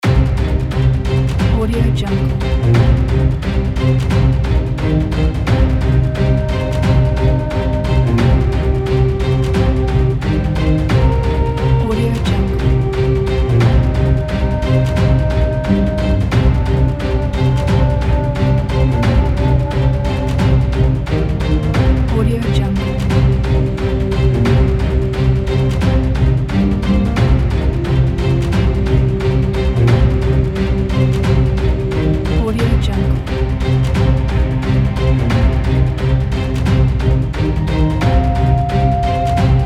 epic chinese loading loop

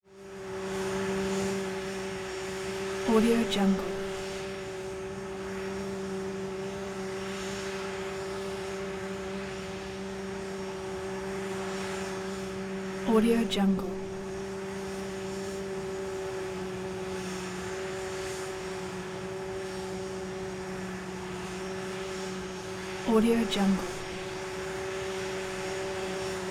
lockheed s3 viking warmup buzz; prosoundfx

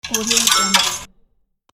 coin slot machine

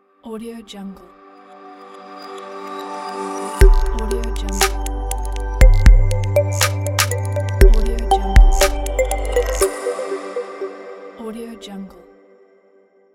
crystal digital logo

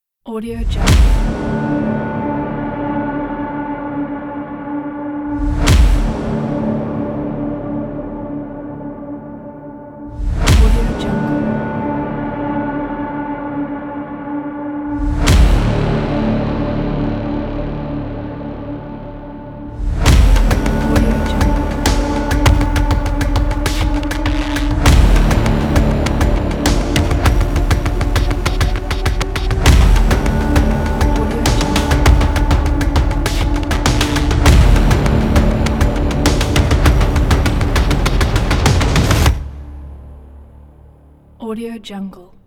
epic trailer

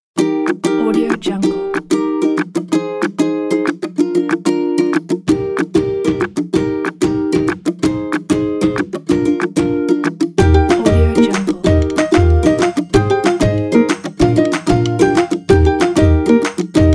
a happy

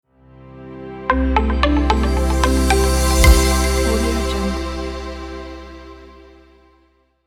clean logo reveal

